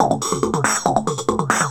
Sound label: Throatbeat